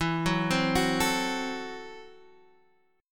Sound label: Gb Minor 7th Flat 5th, Guitar